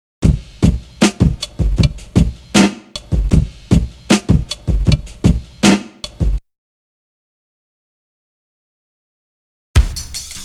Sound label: Drum loop; BEST OF DRUM BREAKS 30